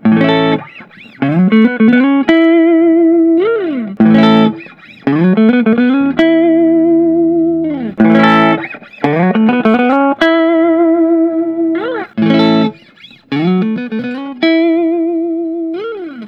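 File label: nik huber orca brazilian tobacco burst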